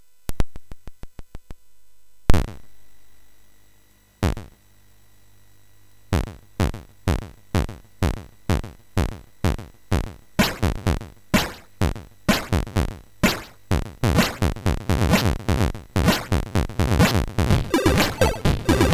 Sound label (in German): Kirchenlied MOS6581R3; sid; hvsc